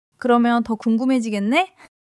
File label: laughter